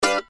match guitar; open toontown resources

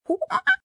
monkey med; open toontown resources; dial

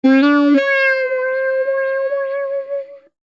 MG lose; open toontown resources

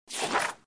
sticker book turn; GUI; open toontown resources

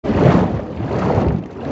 swimming; open toontown resources

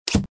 GUI quicktalker; open toontown resources

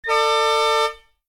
KART race Start; open toontown resources

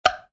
tick counter; open toontown resources

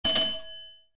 trolley bell; open toontown resources

